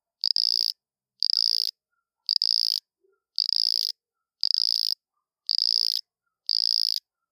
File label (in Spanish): Ranita grillo